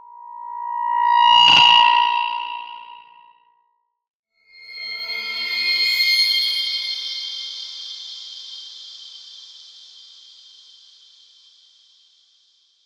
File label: Ice Spell Healing Regeneration; Healings; Arctic Aura